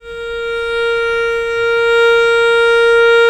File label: A#3, VIOLE, Historical Instruments; USB Soundscan vol.23